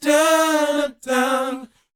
BOYZ A, Vocal Planet R&B & Dance; ILIO

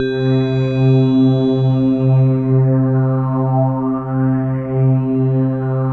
PAD, C4; Flying Cat; Best Service Gigapack 1

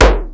sde c1, CASIO FZ-1 Special FX; Retro Sampler

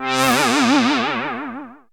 syn c, Dance Synths, Juno; Juno Jam

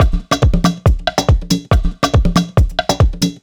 Addon Loop, Drum Loops 140bpm, Perc Loops; Trance; Zero-G Trance Inducer; 90 s Sample CDs

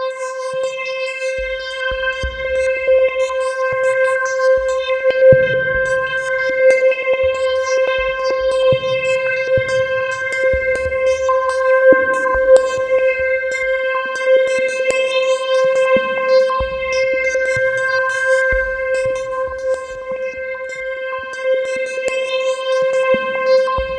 C4, Synth; NiceBeats

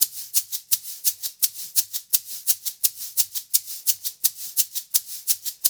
LOOP, SHAKERS, Percussion

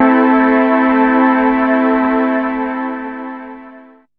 DR. LOOP, TRANCE; X-Static Goldmine 3

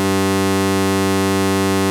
2600 G2, ARP 2600 Synthesizer; Hollywood Edge - Giorgio Moroder Rare Synthesizer Collection

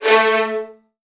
A1, VN 16TH, Virtuoso Strings; ILIO; Kirk Hunter

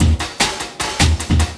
LOOP, 150 BPM, Drum & Bass; Ueberschall; Drum & Bass Resonance

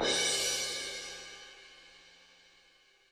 HiHat & Crash, Roland TD-20; HiHats

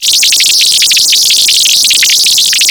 HI BURBLES; Zero-G Ambient 1

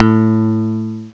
ELEBASS; CMI Sound Library Rev 1.3

